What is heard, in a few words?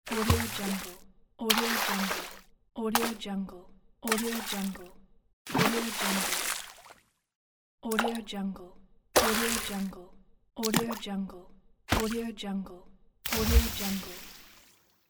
water splash